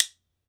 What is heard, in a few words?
WOOD BONE, Ireland; E-MU Producer Series Vol. 7 – Old World Instruments